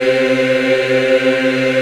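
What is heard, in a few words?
C3 men women eh, Choir; USB Soundscan vol.28; AKAI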